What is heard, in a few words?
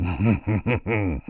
voice wild laugh; Sheriff Of Nottingham